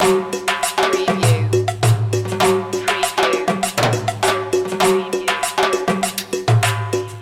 colombia cumbia loop

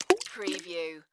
Rock Skip Splash; sound effects bible

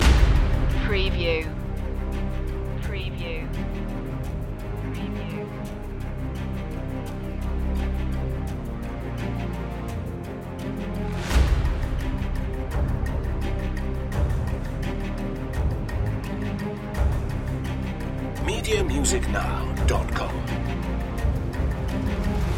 bad moon loop

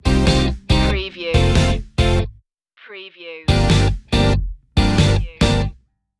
full city stinger

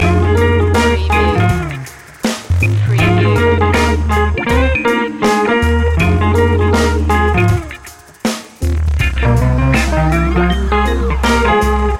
appealing loop

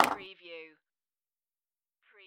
Pinball sound, ball drops

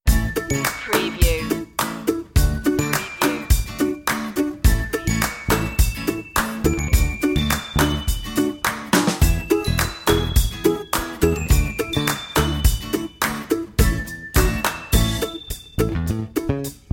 playtime no funny sound-fx

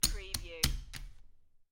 Poker Chips Stack Chips On Top Of Each Other

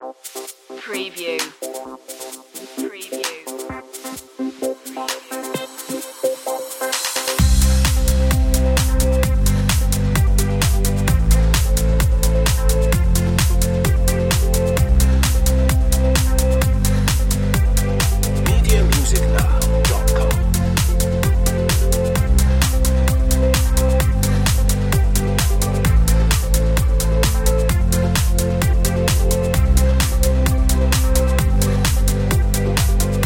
driving energy

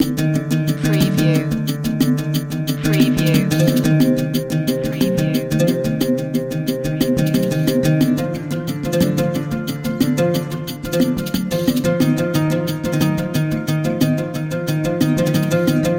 world dance loop

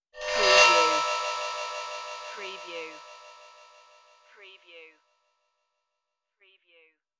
shiny magic transformation

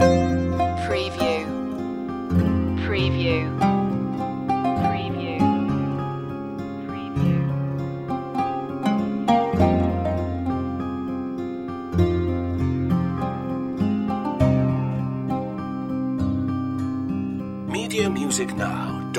ancient city loop

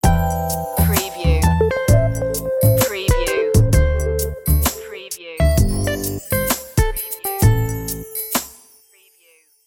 sand and sun stinger

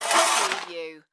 Shovel Scoop Scrape; sound effects bible

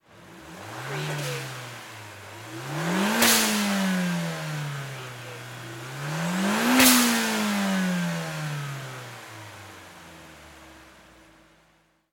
NISSAN SILVIA ENGINE TRIPLE REV

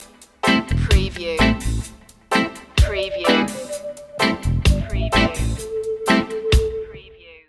reggae love stinger

